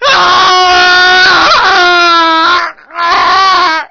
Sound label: arrrgh; Army Men; TanUnits